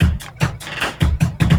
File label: LOOP, Drum & Bass, 150 BPM